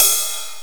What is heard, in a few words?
loud open hat sample g key, tr-707